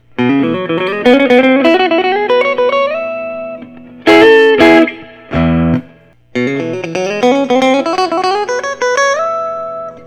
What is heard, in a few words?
parker fly deluxe; 1995